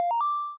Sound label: happy